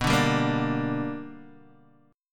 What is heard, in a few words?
B Minor Major 7th Sharp 5th Guitar DADGAD